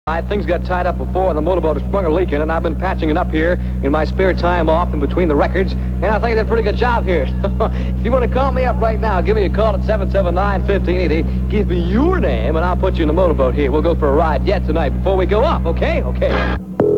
summer motorboat ride; 1966

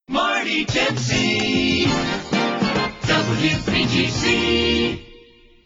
jingles logoset; priority one

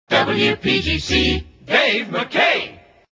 jingles; pams

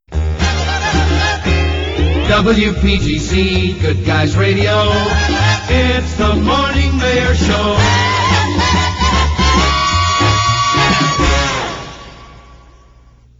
jingles; pams; morning mayor

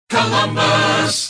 jock jingles; pams series 42a; columbus

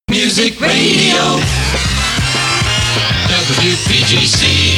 jingles hybrid; pams

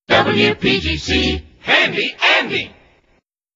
jingles; pams; handy andy

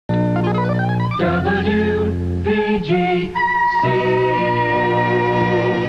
jingles

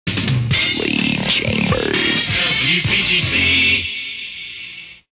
jock jingle; lee chambers

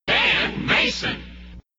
jingles shout; positron

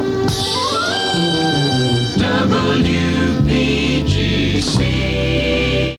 jingles; drake aa image pack originals

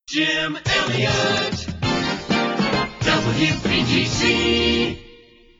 jingles logoset; priority one

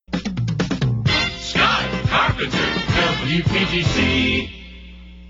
jingles; positron

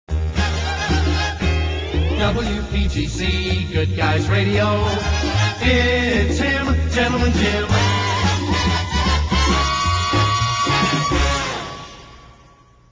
jingles; pams; gentleman jim madison

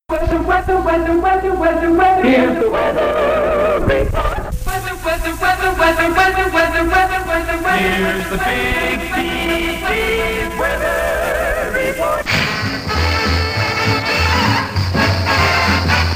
jingles weather

